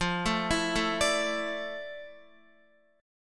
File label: F Major 13th Guitar; arpeggiated; JGuitar